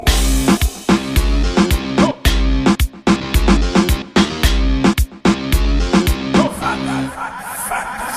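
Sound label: reggae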